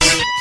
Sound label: janky horn